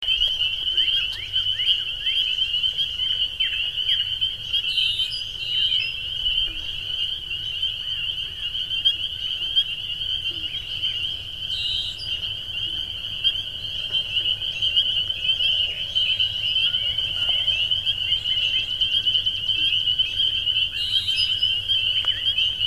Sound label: Spring Peepers; Finzel